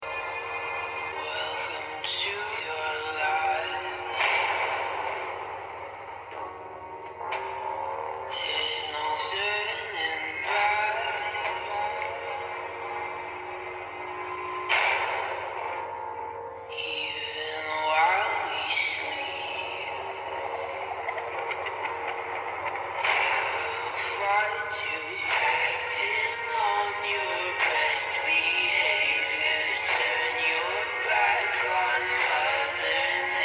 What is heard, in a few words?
speakers test sample Dolby enabled; GIGABYTE G5 K5F